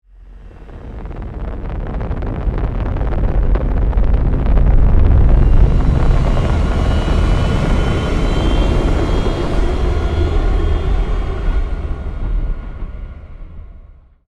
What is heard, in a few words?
Cargo spaceship take off